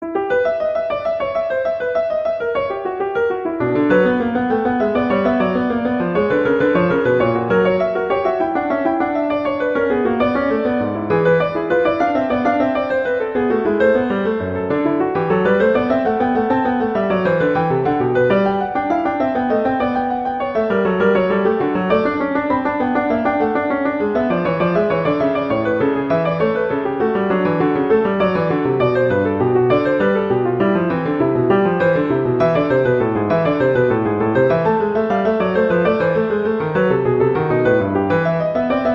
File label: Fugue; Bach; Prelude Fugue X